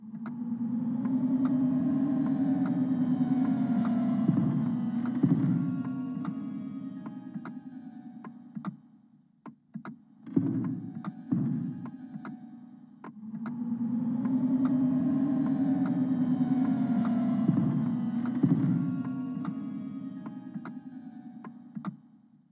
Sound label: Dining Room Ambiance